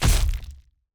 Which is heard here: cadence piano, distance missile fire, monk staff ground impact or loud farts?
monk staff ground impact